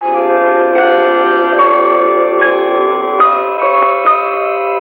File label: Ancient Music FX, Industrial